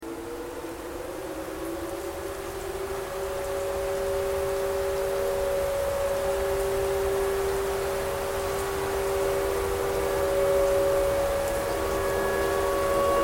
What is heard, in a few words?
tornado siren tornado siren thread noise